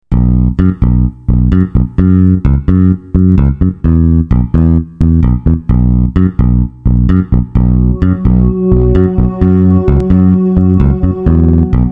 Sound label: Duelin Bass Waves